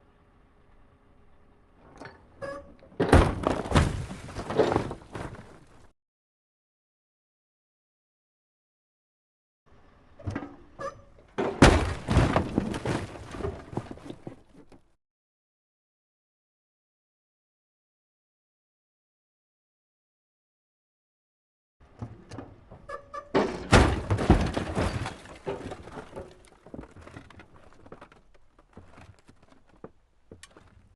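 car destruction chassis impact exterior drop on ground metal crash bounce various; mkh8060; sonniss gdc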